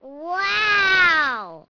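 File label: girl wow; Microsoft Graphics Studio; Home Publishing 99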